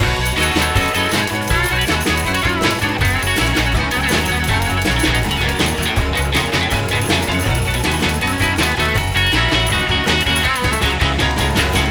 big Win Loop; netent

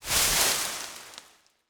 wild convert start; netent

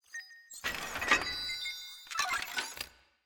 scatter Up; netent

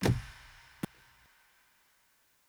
spinbutton click; netent

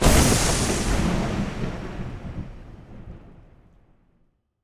Big Win big Win Start; netent

